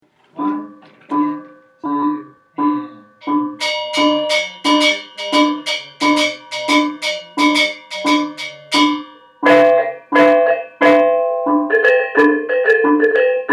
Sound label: Joged Reyong, gamelan